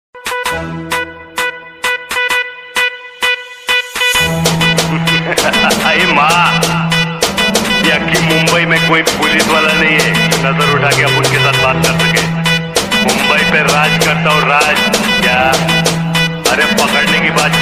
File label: background music khatarnak ringtone gangster; sanju baba